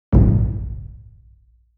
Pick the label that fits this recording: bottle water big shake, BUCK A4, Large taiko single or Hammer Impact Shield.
Large taiko single